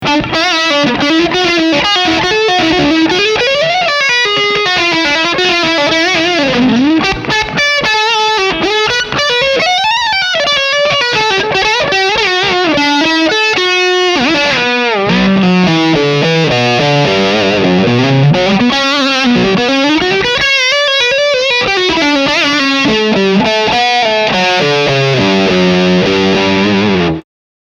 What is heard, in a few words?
maximum gain lead